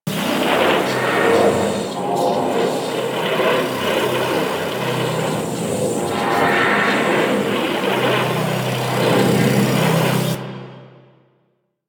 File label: Corpus Ship Vent Room Reactor Loop; Ambience; Props; Warframe; Corpus Ship Remaster